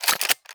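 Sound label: xm1014 pump, shotgun; arccw go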